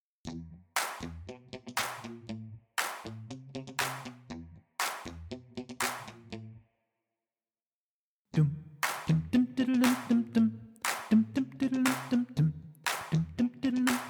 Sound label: low